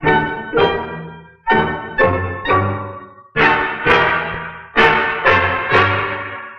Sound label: horns, Sad